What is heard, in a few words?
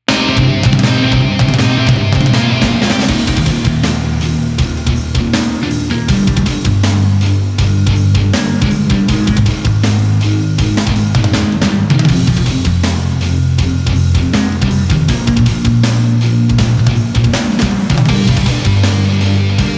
Band Only; Gothic Storm Music